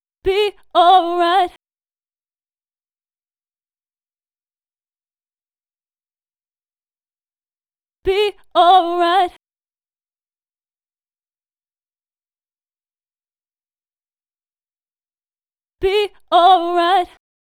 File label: 123 GbMaj BVs low